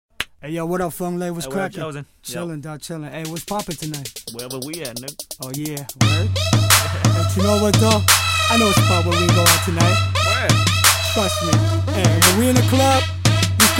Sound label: Rap